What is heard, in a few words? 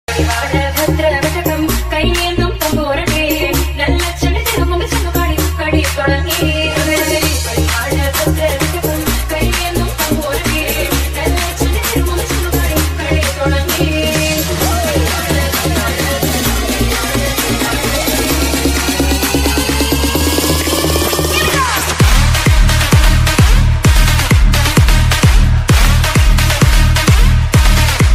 remix bgm